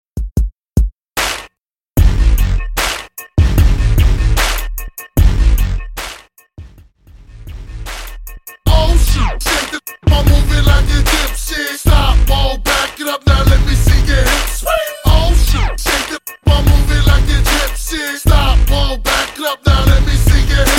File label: Swing Extended; Redrum